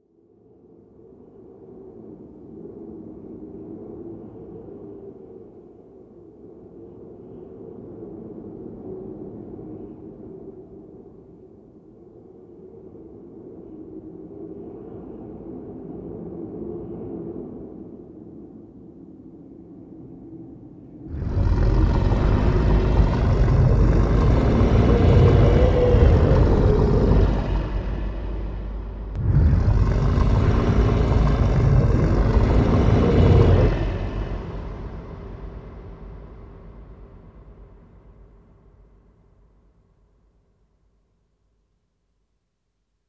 Lion Breath Through Reverb Processing For Ghostly Beast; ghosts & demons